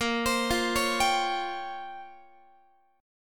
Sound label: Bb Minor 6th Add 9th Bb down Guitar; Bbm6add9 arpeggiated